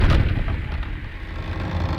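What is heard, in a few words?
Music Volcano Light 120; from dust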